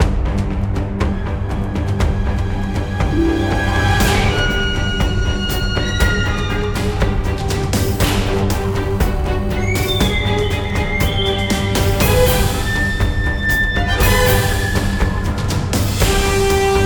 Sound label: Battle; hidden dragon legend